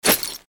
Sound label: UI Wear